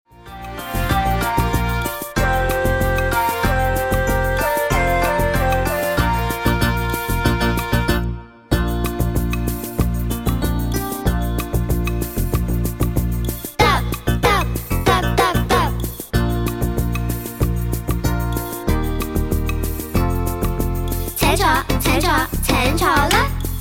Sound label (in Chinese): Karaoke, 兒童詩歌